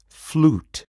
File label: flute